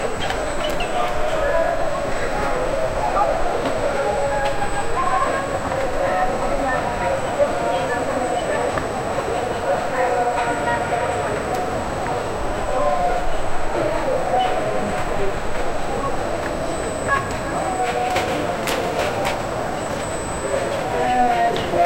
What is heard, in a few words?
an ancient busy middle ea, middle eastern